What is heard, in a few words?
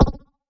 perc